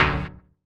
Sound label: HOUSE, House Dance